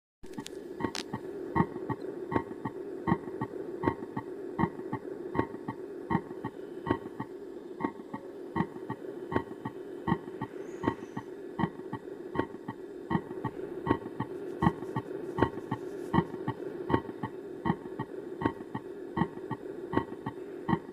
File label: Normal sounds; simulatorvzorky